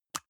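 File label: deodorant can lid open soft; haus-garten; badezimmer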